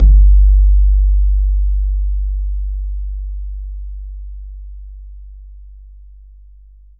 BAYAN, Drums Percussion

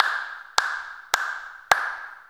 Hand Claps; Sonic Foundry (Sony Creative Software); World Pop; Percussion

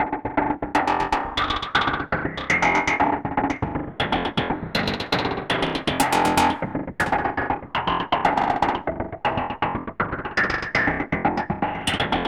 SKIPPING HATS; Zero G; Malice In Wonderland

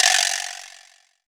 Vibraslap, Perc; Roland BK-5

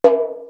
FRAME DRUM 1; East West; Global Sounds

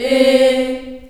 C3 CHS EH, CHILD EHS, Choir; USB Soundscan vol.28; Choir Acoustic & Synth; AKAI

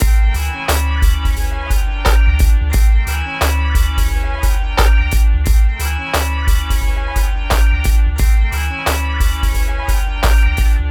MIX, Hip Hop and R&B Drumloops; Best Service ProSamples vol.01; AKAI

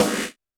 Snare, Roland TD-20

